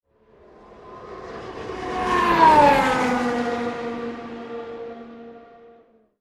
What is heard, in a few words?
formula one car sound